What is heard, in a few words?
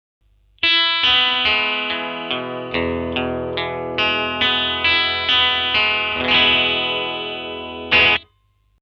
jag 2001 bridge strangle